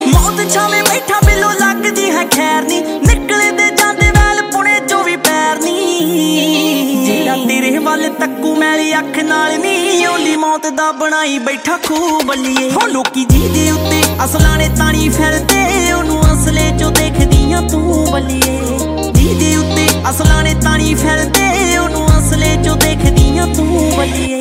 Ringtone

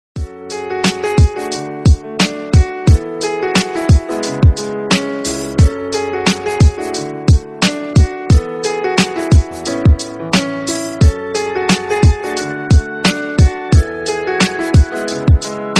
sad beat; ringtone

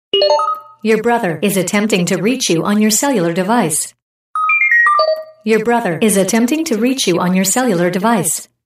Brother Calling Ringtone

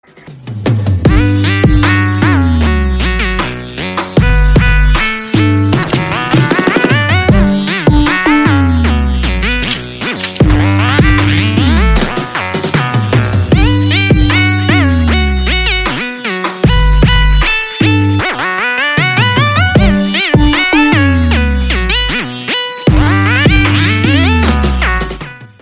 Attitude BGM Ringtone

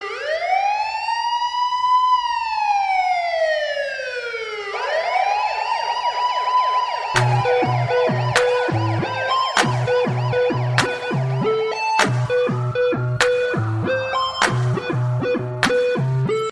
Best police tone Ringtone

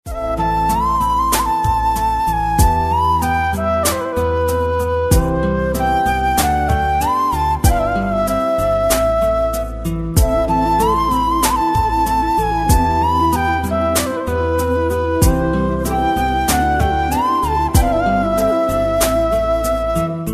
love flute

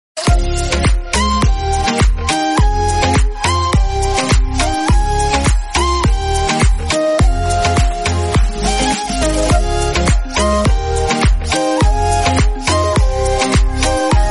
Instrumental Ringtone

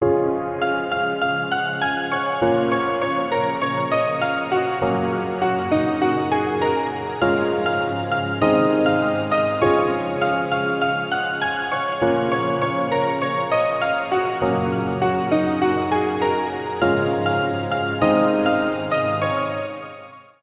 Soft Instrumental Ringtone

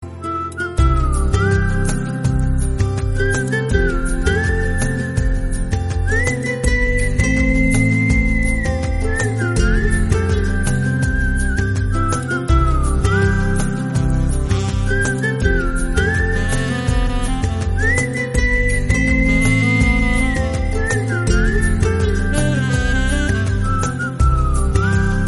Romantic Flute

